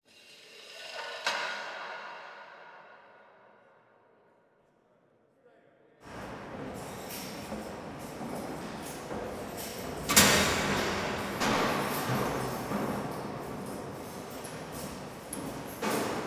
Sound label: Void Ambient; X2 Wolverine's Revenge; Nintendo GameCube